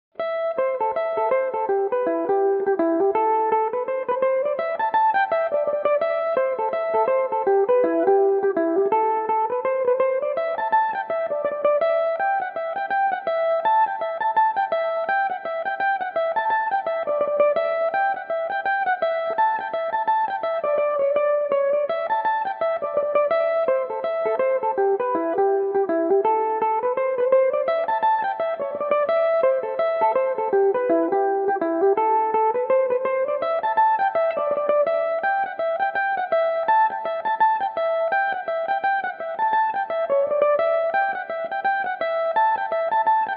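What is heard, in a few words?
reel A dorian, irish mandolin, tunes; apples in winter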